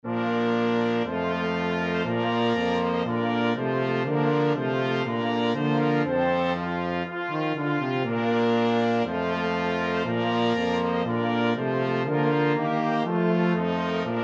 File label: trumpet voluntary full, flexible mixed ensemble 5 players